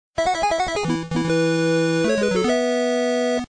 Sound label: power up; Twin bee